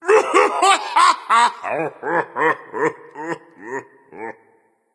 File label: boohoo; fenrir